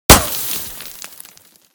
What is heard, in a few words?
sand, bullet collide; Anomaly Bullet Cracks and Impacts Redone